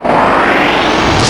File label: loose cannon charge; tf2